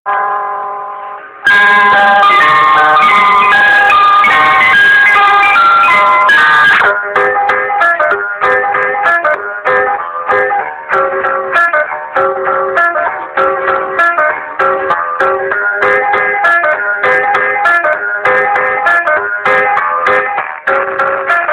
carrito de helados

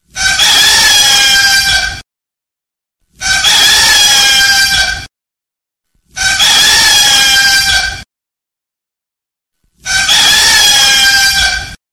Canto Del Gallo

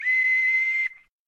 Silbato

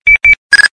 Radio Policia

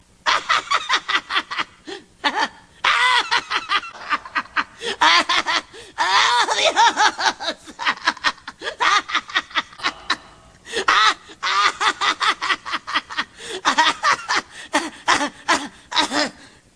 Risa; De Erik Cartman

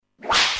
Latigo

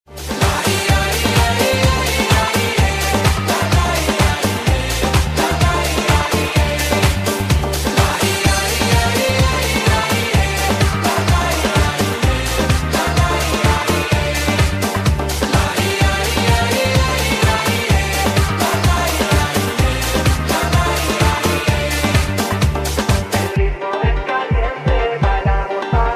Musica Latino

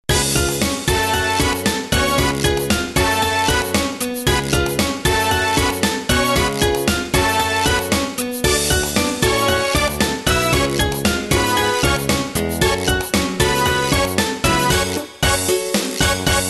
Ritmo; sonyericss

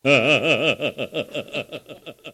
RISA LOCO

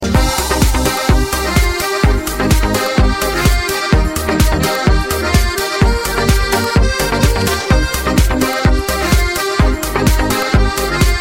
Acordeon

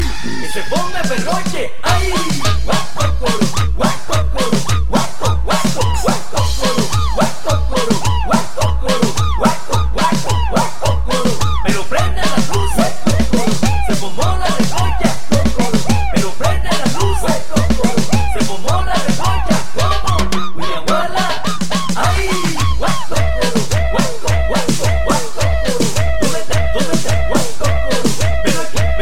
Champeta; chavo

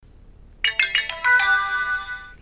Alarma; Zonda

